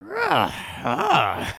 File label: Stunned, creatures organic